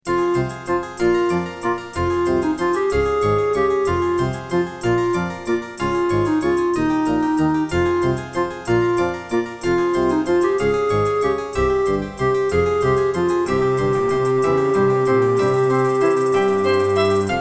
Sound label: Roland MT-32; Death Gate